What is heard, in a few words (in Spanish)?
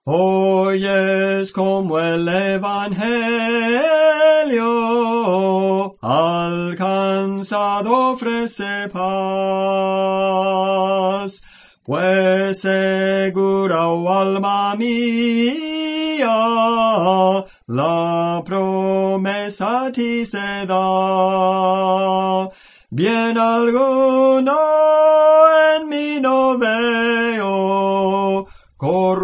Soprano, Cantos Espirituales; Soprano (melodía); Clase del Canto